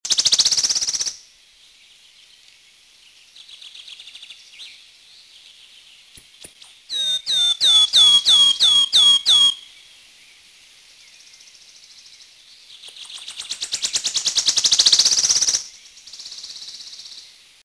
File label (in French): pouillot siffle